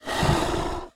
CERDO EXALAR; Yesterday Origins; Switch, PS4, PC, iOS, macOS

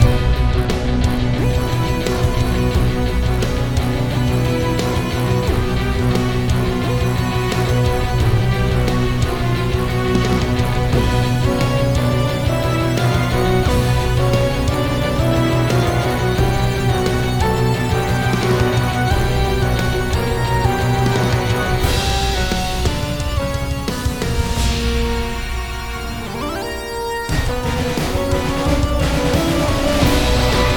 batalla loop brutal; noahmund; quivaluin

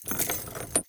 CADENAS DRAG; Yesterday Origins; Switch, PS4, PC, iOS, macOS

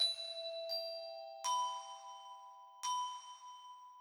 Melodia Cristales; Hostil; Android iOS Linux MacOS Windows

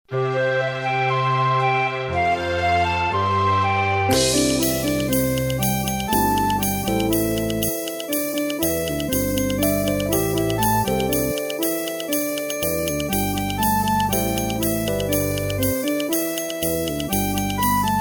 Abecedario (Instrumental); Abrapalabra La Magia de Aprender a Leer

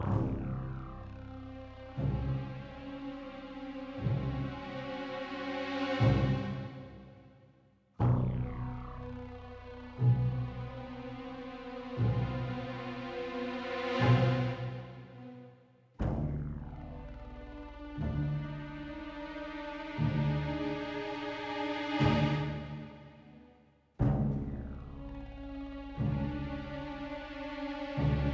music pueblo Maldito; Lootlite; Xbox Series X/S

